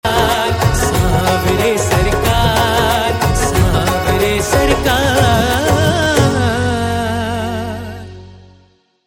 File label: Shyam Bhajan